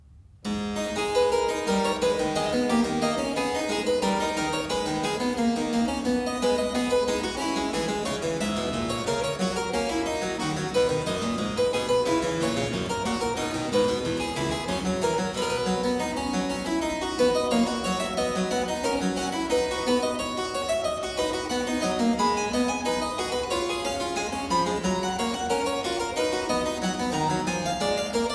Zweistimmige Inventionen in A minor, Keyboard Works; BWV 784; J.S. Bach; Inventionen & Sinfonias